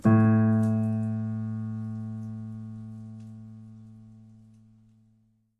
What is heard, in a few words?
piano sample